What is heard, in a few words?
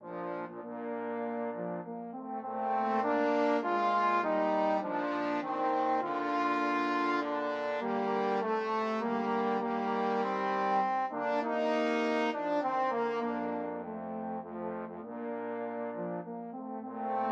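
mendelssohn nocturne trombones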